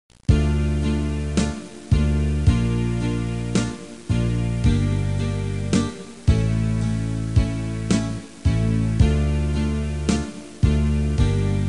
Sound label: alto